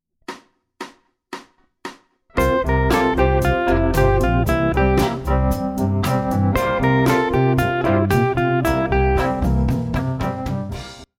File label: Mixolydian Lick